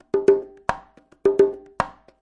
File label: breezy conga, 109 bpm, drum loop; 101 to 110 bpm